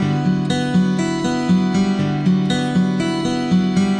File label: acoustic guitar picking